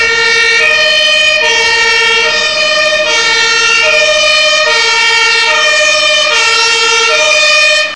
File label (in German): Martinshorn